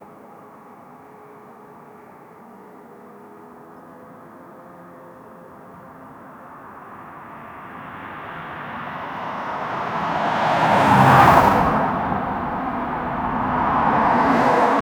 a car driving fast at

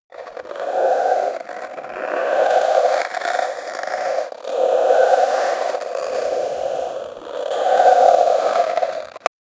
ice skate scraping on ice

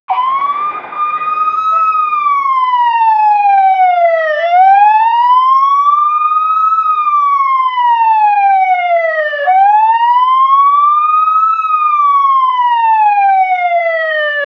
police car siren with engine

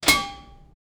metal lid opening with a; machine operation

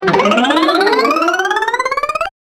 fun rising spin sound, carnival

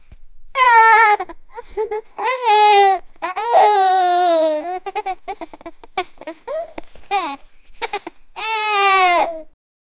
child laughing

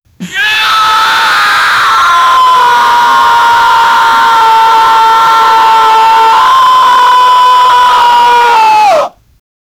scream; hydra king

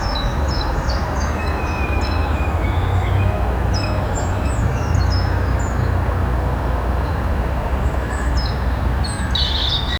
urban environment parkhu, car horns

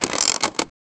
lowering the mechanism, claw machine

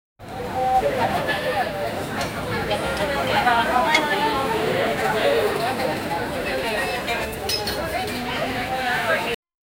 street food crowd

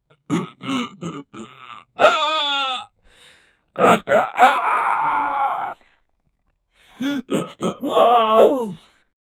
angry human

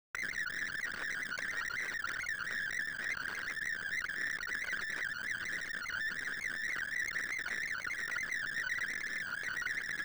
screaming alien sound

